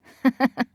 laugh; peko

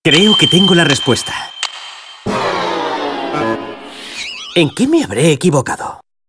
lose puzzle; clive

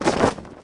coat

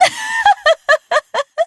cackle; kyrie

